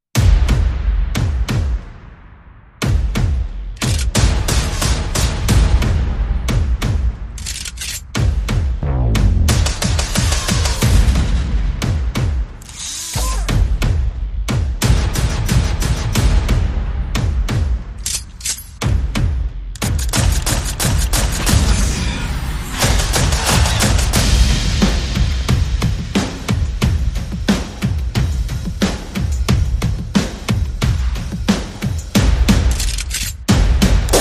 Percussion FX and Guns

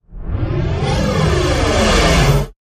Space Ship By Fast

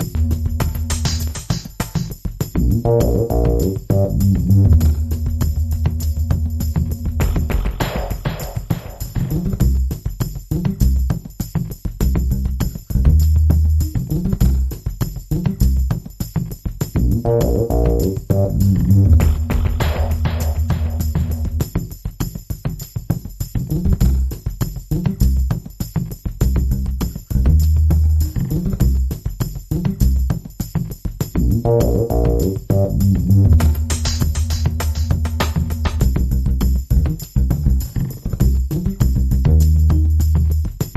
Moving Bass

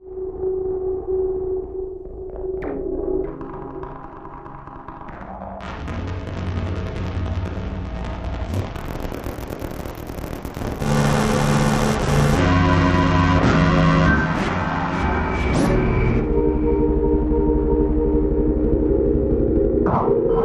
Music Pad

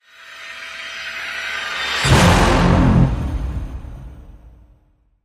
Hit Orch Release The Beast F

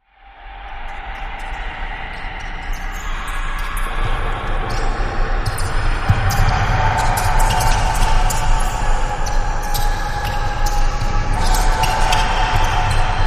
Court Kings Game Atmosphere

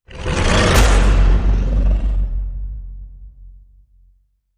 Creature Hit

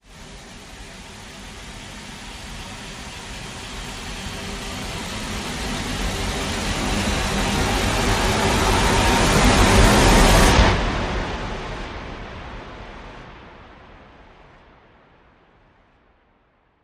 Rise Long

